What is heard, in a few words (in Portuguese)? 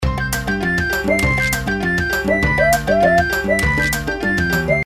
Chorinho Feliz